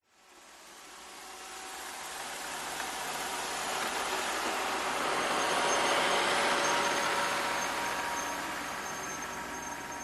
household vacuum robot vacuum close and passes carpet